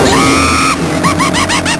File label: bounce; valve